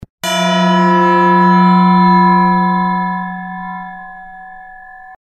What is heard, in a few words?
gong